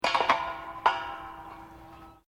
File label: cylinder gas presurized pipe attatched, Home heating gas bottles being replaced; Machinery Sound Effects